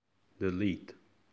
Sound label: delete